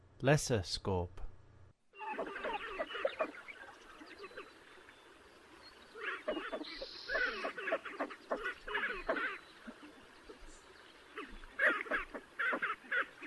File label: Lesser Scaup, Songs of Birds; North America; Western USA; Western USA Songbirds